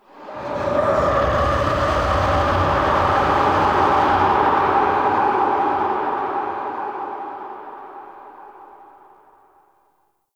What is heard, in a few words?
Sentient Vessel Delay Scream; Warframe; Tau